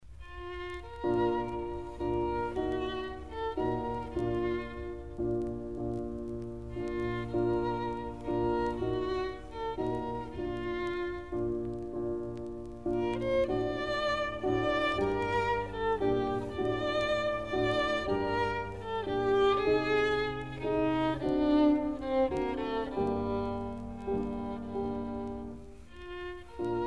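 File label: violin sonata; weber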